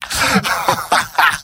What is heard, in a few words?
m laugh happy; tf2; garrysmod; mvm